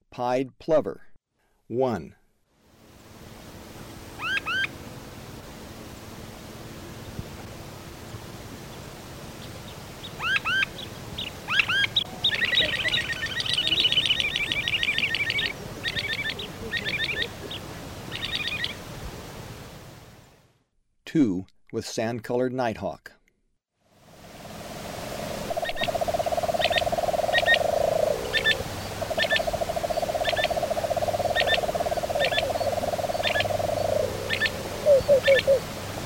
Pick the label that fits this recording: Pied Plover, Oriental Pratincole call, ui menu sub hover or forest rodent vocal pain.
Pied Plover